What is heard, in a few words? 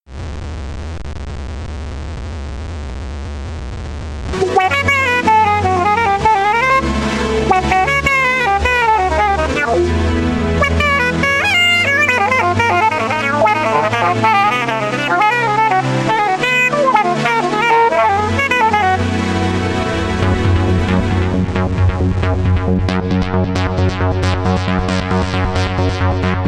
christmas music